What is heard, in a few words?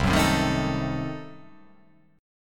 C Diminished 7th C